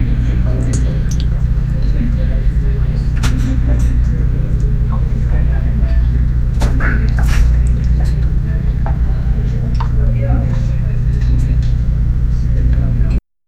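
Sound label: platform chatter 5 6 people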